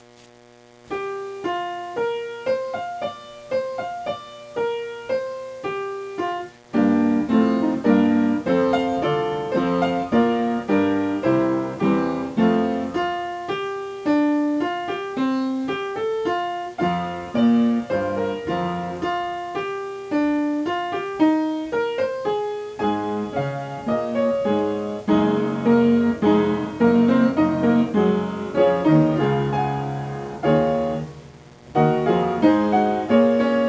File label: promenade, piano